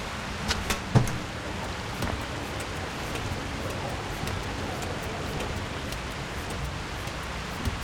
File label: climbing; mirrors edge